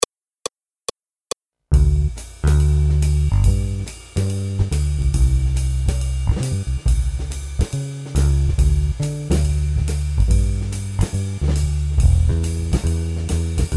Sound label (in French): Jazz ' N Swing, Accompagnement seul